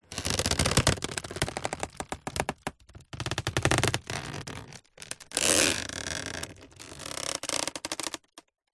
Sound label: household basket wicker creak movement